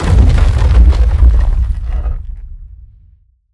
Rock Prison Collapse; Evolve; Behemoth

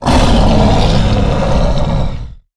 Pain Light; Evolve; Goliath

